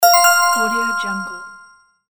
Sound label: slot prize